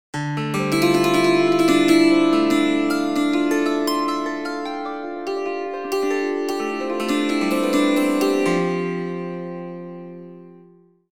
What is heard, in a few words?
dulcimer